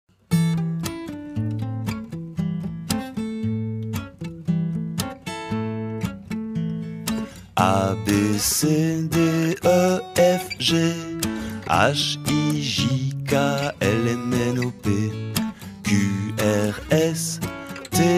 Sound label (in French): La chanson de l'alphabet Comptine